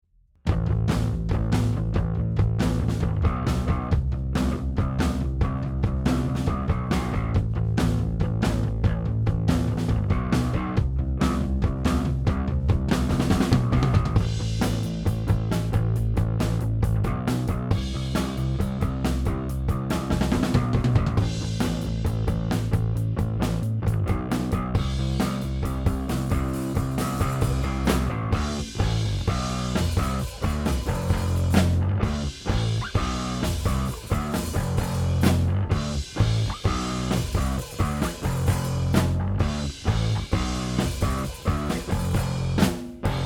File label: Drums and Bass Post Bass Comp